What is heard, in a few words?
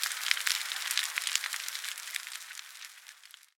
burning; smite; zhongkui